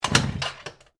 Motorcycle Kick, Transportation, Automobile, Motorcycle, Kick, No, Start